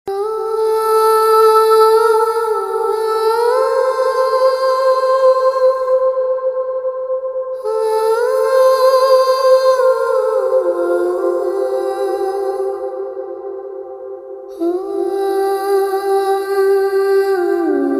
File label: Lady Humming